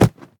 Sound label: Drop Book; Devour; The Town